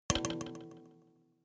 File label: notification kalimba delay body hit